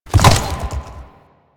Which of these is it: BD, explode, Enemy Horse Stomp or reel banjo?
Enemy Horse Stomp